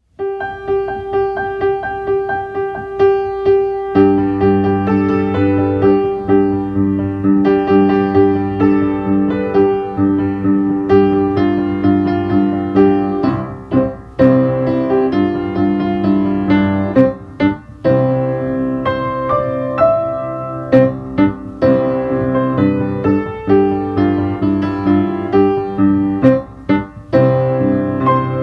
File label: do re mi accompaniment